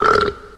burp short

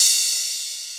Real Open Hats

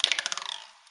rebound clack, percussion; Misc Percussion

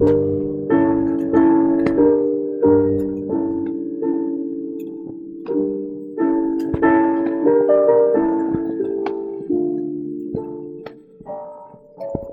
piano lots of pops, Loops Sad

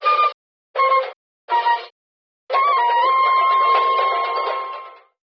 flute; Phonky

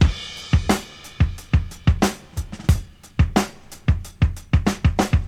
drums away, funky drum loops

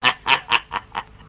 laugh devil

